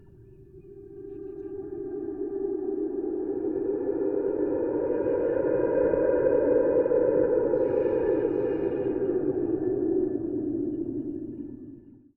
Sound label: wind